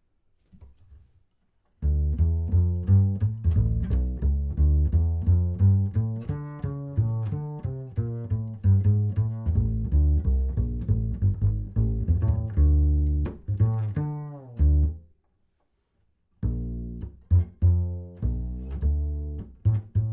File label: string Bass V63M, mic tests